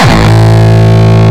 Longkick, kicks

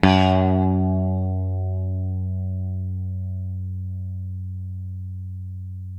SITAR F#1, CORAL SITAR; East West; Steve Stevens Guitar Samples Collection

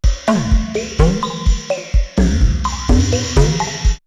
R8, drumloops, DrumMachines; X-Static Goldmine; 90 s Sample CDs

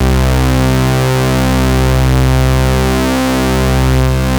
C3, BASS; Q Up Arts; Dream Experience; BASS BANK

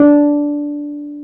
PNO, Wurly

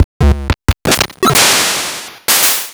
Nintendo Game Boy Advance SP

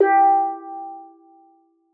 steeldrum G3; Xsample VOL-4

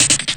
RSS, 160 BPM

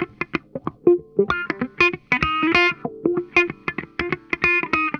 NOTE, Funk Guitar; Zero G - Funk Guitar

